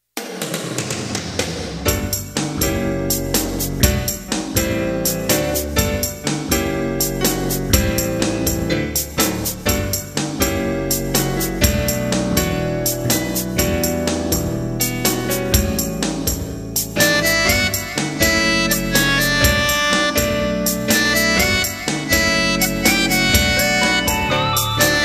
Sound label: dance